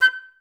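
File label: flute staccato F5; Best Service; Peter Siedlaczek's Advanced Orchestra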